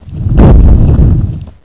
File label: awaken, golem; Hexen II